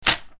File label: paper tear; easyrpg; WitchsHeart